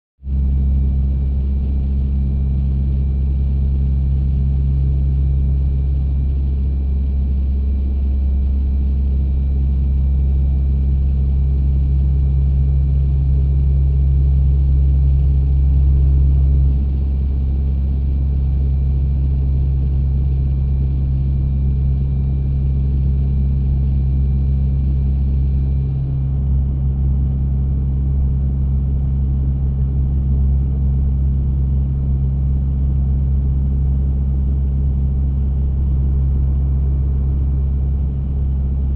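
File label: P3 Orion Int Steady Flight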